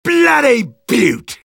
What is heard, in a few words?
sniper cheers; vo